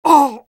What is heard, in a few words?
pain sharp; spy; garrysmod; tf2-content-gmodcontent